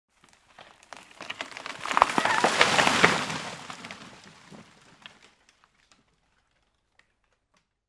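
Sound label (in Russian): коротко завалилось дерево с писком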